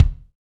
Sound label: BD, BD REAL